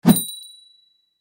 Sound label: Bright whoosh and ding; motion graphics sound effects